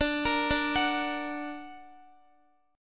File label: D Augmented D down Guitar; D+ arpeggiated; tuning 4E,4A,5D,5G,5C,6F